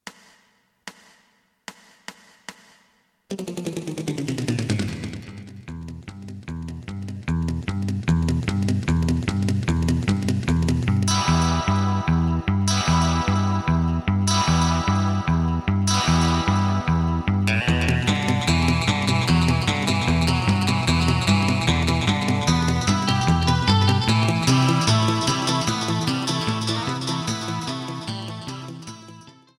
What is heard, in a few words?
drum